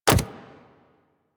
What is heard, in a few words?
Step Default, Foley Footsteps, Pets; Warframe; Zanuka; Zanuka Companion A